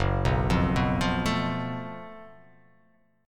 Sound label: F sharp Minor Major 7th Double Flat 5th, guitar